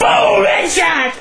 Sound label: Head Shot; KGB; valve